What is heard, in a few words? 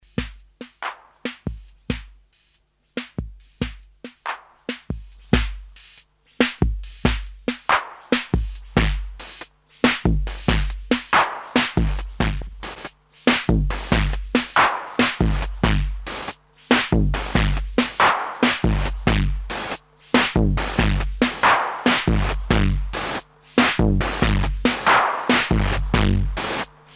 tr808 se300 tape